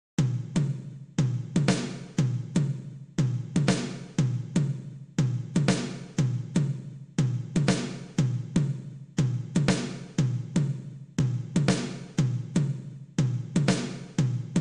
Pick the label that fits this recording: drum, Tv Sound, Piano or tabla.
Piano